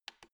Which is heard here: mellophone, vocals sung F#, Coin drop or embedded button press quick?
embedded button press quick